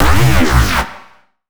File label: fat growl, bass